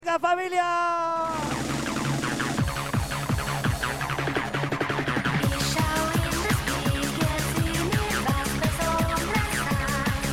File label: World Dance Music